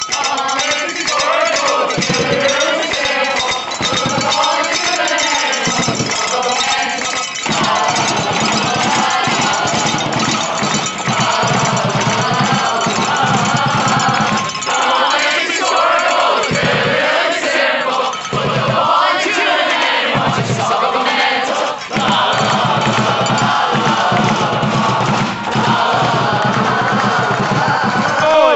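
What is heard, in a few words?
come on lansing score a goal, football chants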